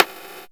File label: Gated Kit, Percussion; Best Service ProSamples vol.37; Dance Synths